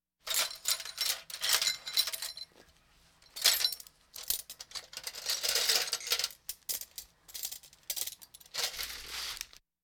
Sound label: Hospital effects